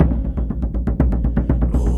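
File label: Music Shield; from dust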